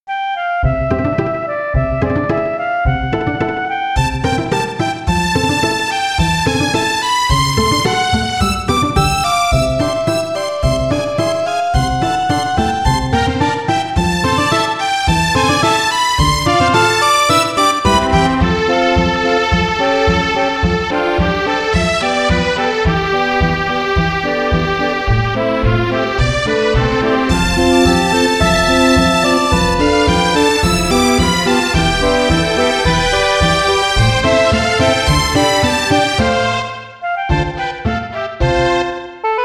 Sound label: 16bit